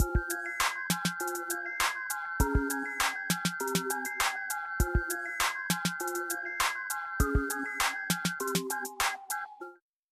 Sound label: The drums and both synth loops; 拉丁陷阱音乐